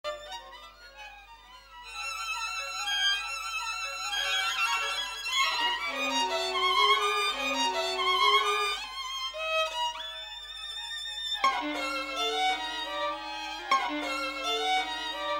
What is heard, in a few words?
classica